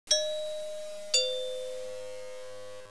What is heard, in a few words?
doorbell; XMEN2